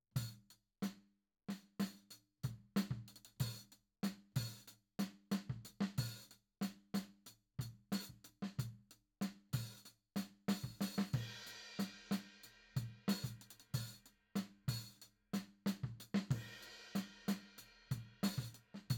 Tom

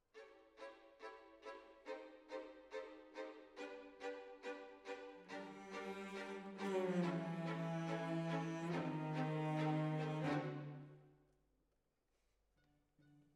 Decca, Jazz for piano

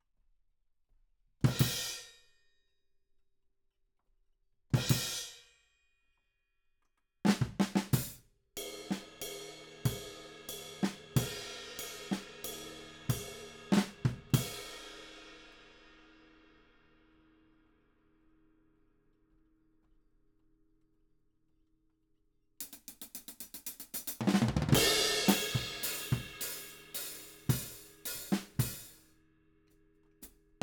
Ride

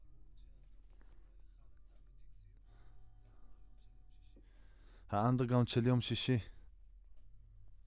Voc OD

